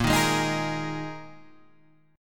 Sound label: Eb Suspended 4th